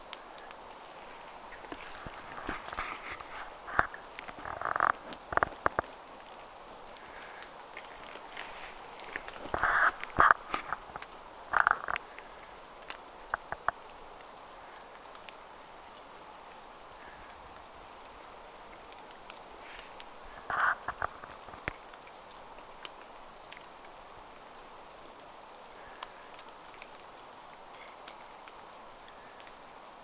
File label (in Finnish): ti-puukiipija; pikkulintuparvessa